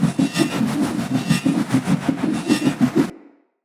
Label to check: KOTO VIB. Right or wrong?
Wrong